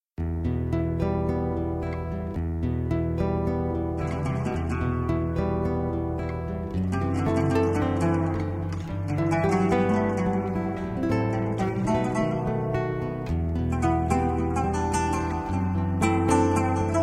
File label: romantic music